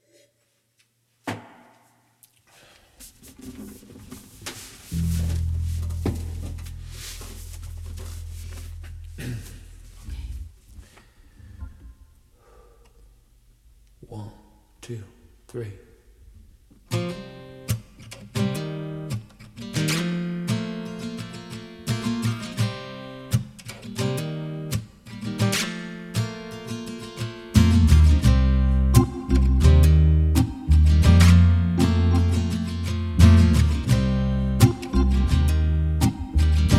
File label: Acoustic Session; Foolstop Black Noise